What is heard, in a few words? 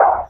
footstep; hell